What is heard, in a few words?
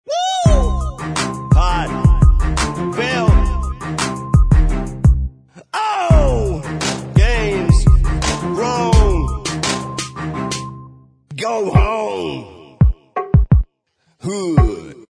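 Bvox Track, backing vocals track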